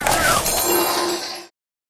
Wild Flower Die

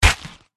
dog demon attack contact up

